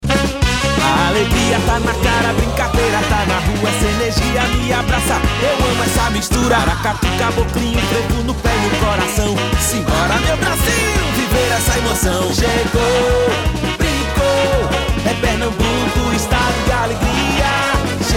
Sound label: Jingle; Carnaval 2025; Pernambucanidade; Empetur